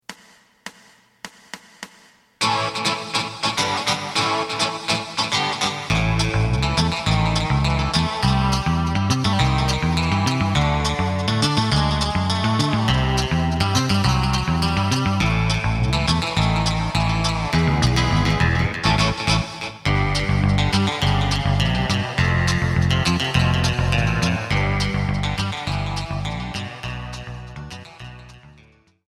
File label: drum